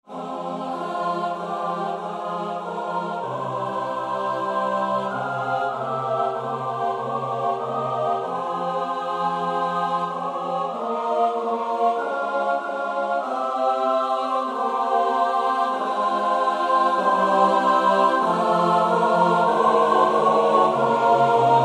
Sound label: CHOIR